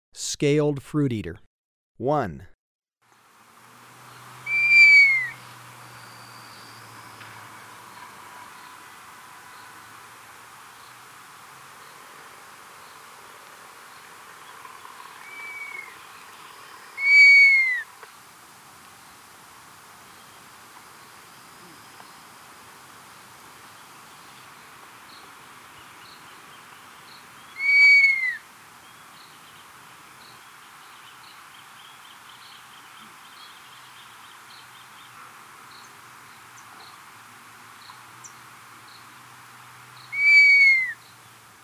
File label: Scaled Fruiteater, Birds of Ecuador; S. America